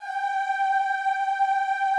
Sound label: G4-R, Classical Choir; Best Service; Extended Classical Choir